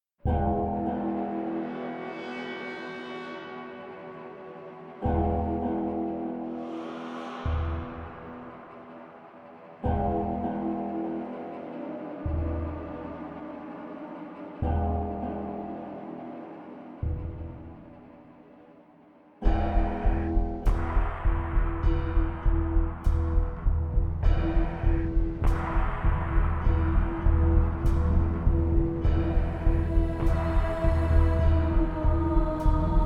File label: Machine Hymn